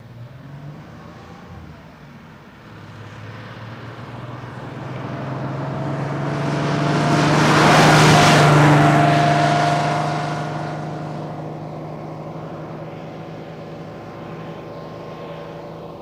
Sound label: Fire Truck By Gear Shi